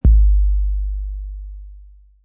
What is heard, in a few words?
low kick, drumkit